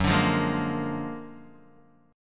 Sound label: Gb Augmented Major 9th Guitar DADGAD